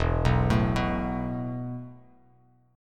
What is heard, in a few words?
E Suspended 4th E, down, Bass Standard; Esus4 arpeggiated